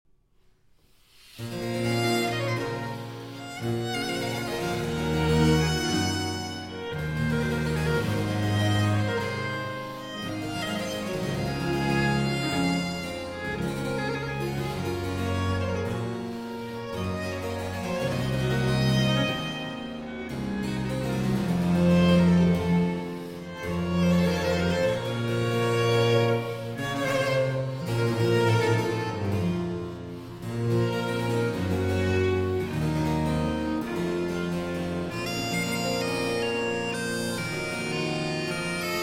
Concerto For Musette, 2 Violins and Basso Continuo in C Major, Adagio